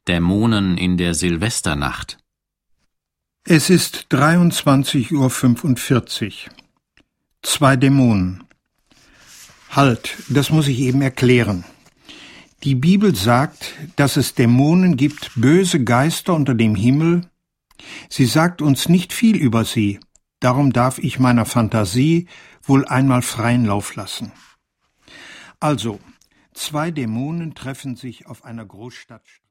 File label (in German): festmusik zu 6 stimmen